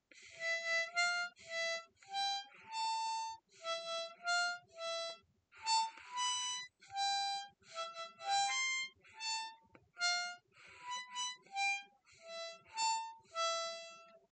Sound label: clean, harmonica, Happy Birthday; clean notes